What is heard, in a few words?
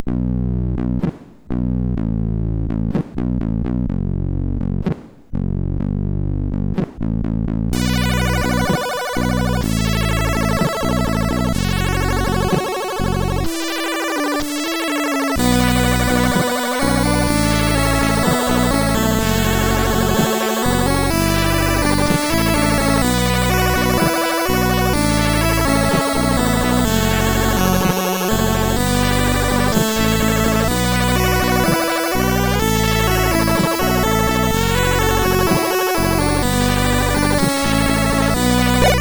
End Tune sid MOS6581R4; Bluez